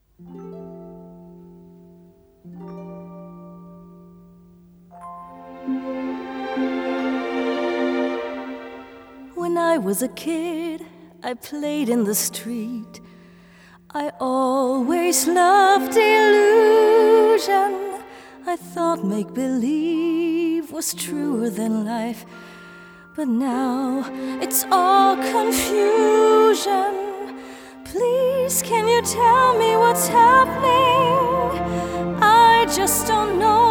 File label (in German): Gesang; Fremdtake